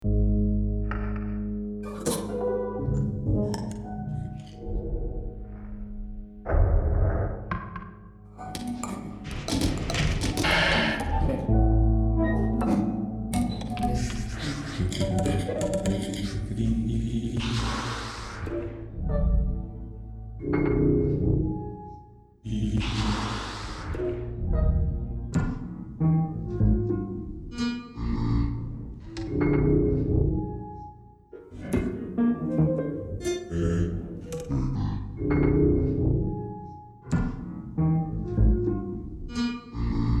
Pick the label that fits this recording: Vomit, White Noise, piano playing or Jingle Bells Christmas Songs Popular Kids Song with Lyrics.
piano playing